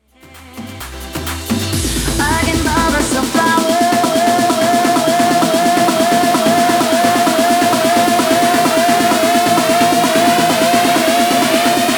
guaracha remix 130 bpm

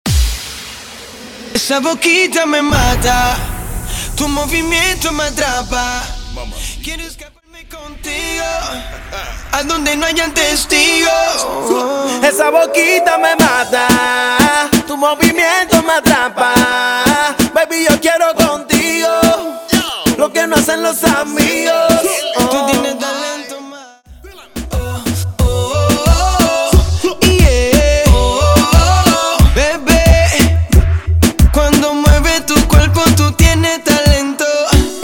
Acapella Starter 90BPM

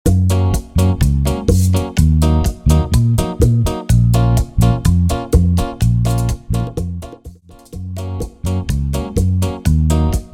Bachata Project 125 BPM

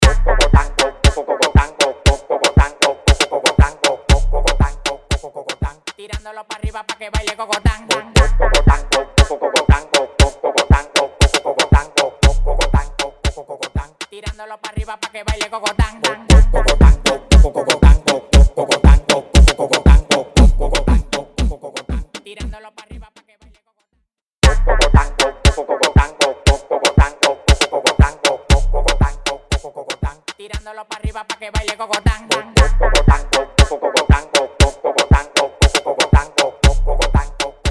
intro outro break 118 BPM

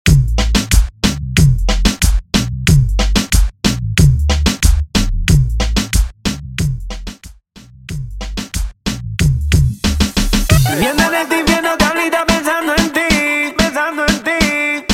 percapella intro outro 92 BPM

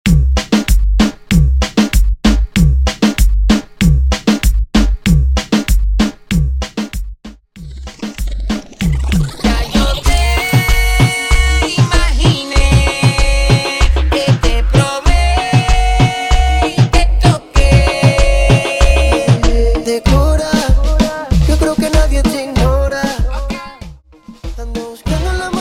Version 96 BPM

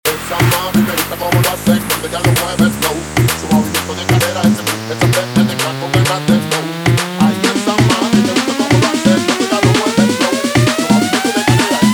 guaracha remix 130 bpm

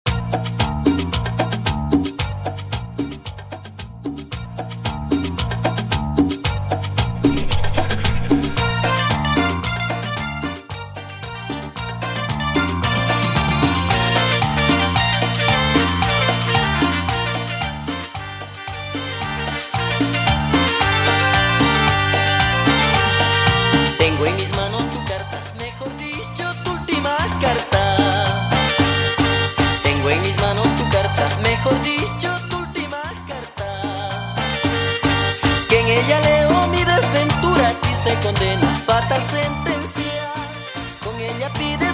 112 BPM Intro Simple

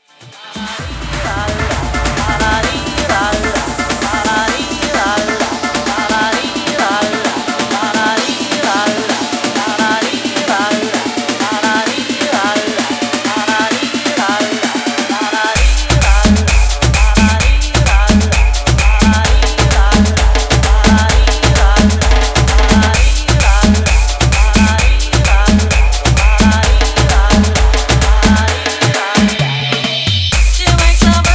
guaracha remix 130 bpm

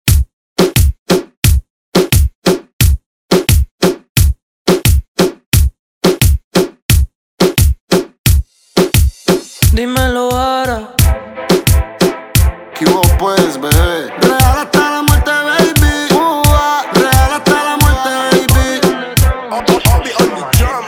remix intro 88 BPM

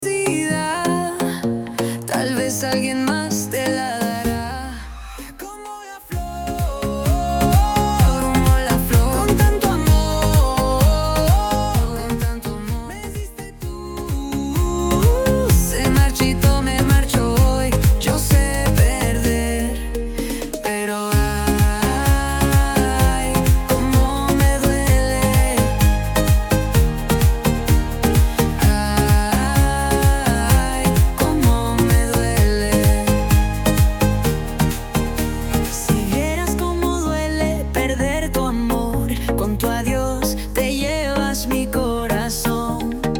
128 BPM

